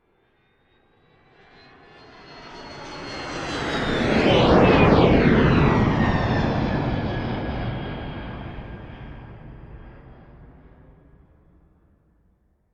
transportation aircraft military jet pass external, Fighter Jet Fly By; Vehicles; Airplanes; McKinneySound